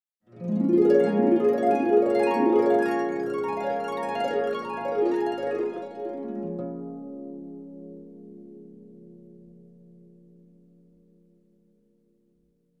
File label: Harp Glissando Ups Downs